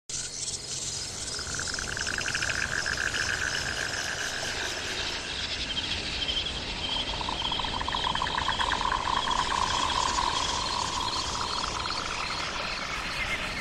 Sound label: wild forest sounds